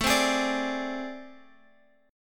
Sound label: A Minor Major 7th Double Flat 5th, down, Guitar, Open G